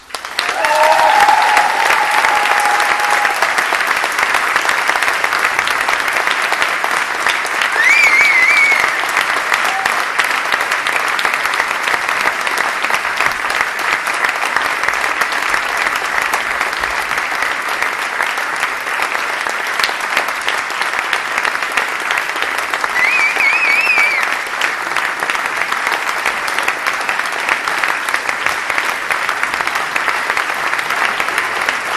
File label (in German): Publikum antwortet mit Applaus; Konzertmitschnitt vom 2. Juli 2024